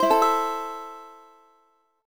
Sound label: Guitar Strum; the legend of zelda phantom hourglass gamerip; Freedle